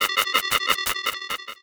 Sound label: Science Fiction Sci-Fi Electronic Computer Readout